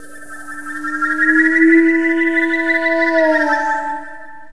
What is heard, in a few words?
SYN, Drum & Bass 124 BPM; Ueberschall; Drum & Bass Flash Trackz